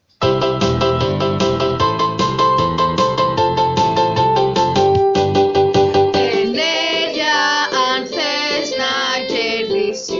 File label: JINGLE